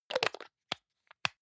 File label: hygiene small screw cup close medium, badezimmer